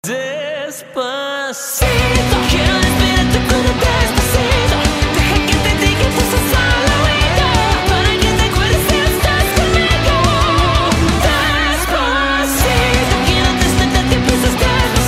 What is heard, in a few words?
Metal